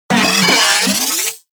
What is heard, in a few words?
Moa C Vocal Ability; Warframe